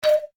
Match Made; disneyxd; bryx

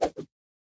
lasso throw; toystory; jessie

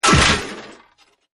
big crash; Wreck-It Ralph

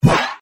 pickup cheetah; disneyxd; stuntastic

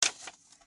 fall; sarge; hamm bill

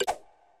dig; Pirates; treasure quest

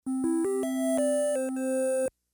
game win; toystory; mr potatohead

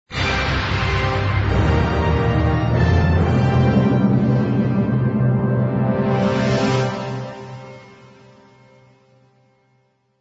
win music; marvel; city under attack

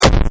damage; Wreck-It Ralph

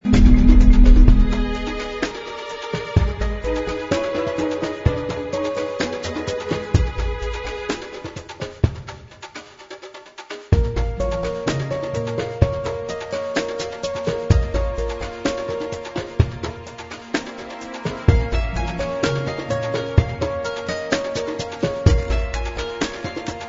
music; russos scopa

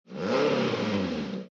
Miata Rev, cars

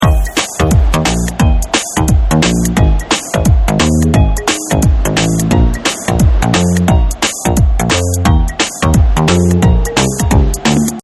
menu music; holidays; snowbrawl

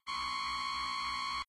Time Warning; john carter; lost symbol

